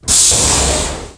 door hangar

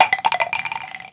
shell

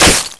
hit glass

